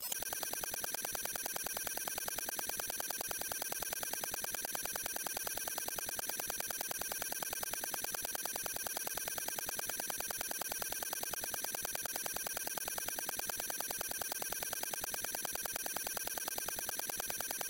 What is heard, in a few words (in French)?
gaine electrique